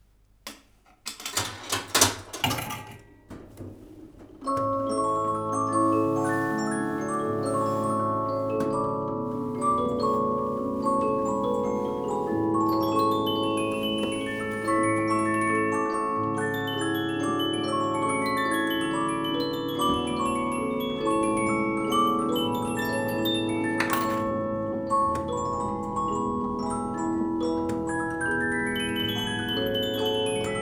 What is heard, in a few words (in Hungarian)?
zenedoboz fémlemezes polifon pénzbedobós; lipcse keszthely; verklihangmuzeum